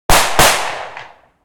usas 12 12g solid slug 55m in front off axis double shots; clean